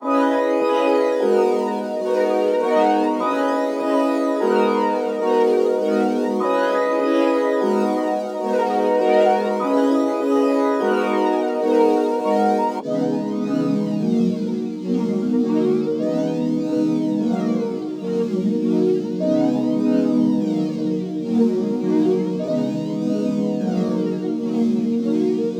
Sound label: 150 BPM G# Min Pad, loop stems; Cymatics; 2022 Melody Collection; Wavy; Nebula